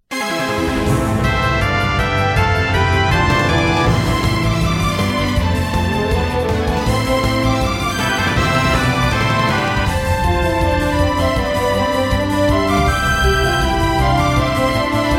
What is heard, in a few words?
battle; 戦闘音楽B; 白熱